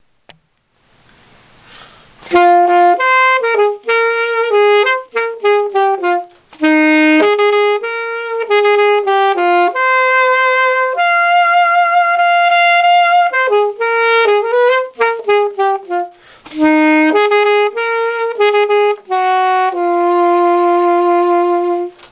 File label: Sax line